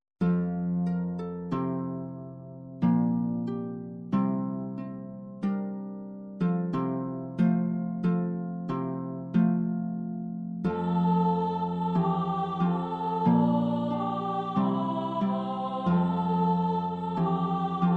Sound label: Lute Voice; Jesus Youre My Priceless Treasure